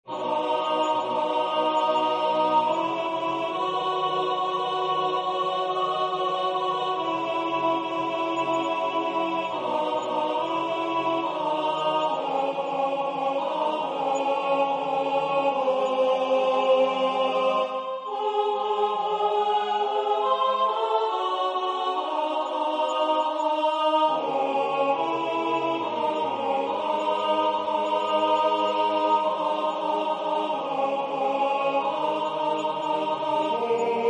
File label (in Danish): Yndigt dufter Danmark - alt